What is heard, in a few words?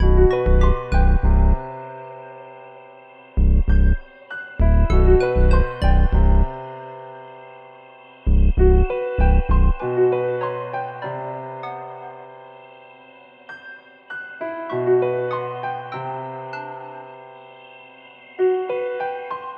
West Coast Melody Loop 98 BPM E Min; Cymatics; Oracle Sample Pack